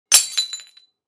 Thin glass smash

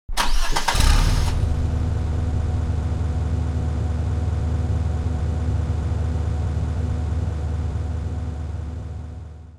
Car engine start up ignition starting up and idle

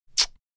Male lip smooch and kiss

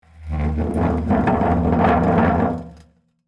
Metal fence short squeaky movement

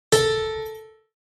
Plucked piano string

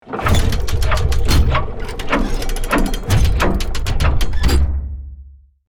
Big cinematic gears movements

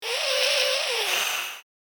Vampire hiss monster aggressive

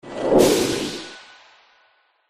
Spell small neutral

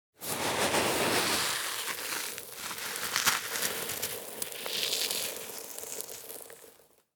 Pouring sand on ground slowly

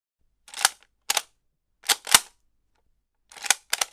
Assault rifle foley manual reload mechanism

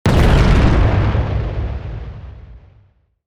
Explosion shockwave impact large powerful

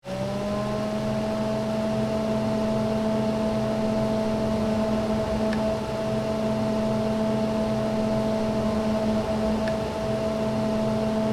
Electric fan blade spinning fast speed; appliances

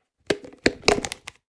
Plastic food container open

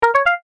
Infographic call answer notification

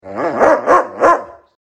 Sheepdog barking repeatedly barks

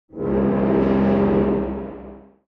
Cartoon tuba flutter

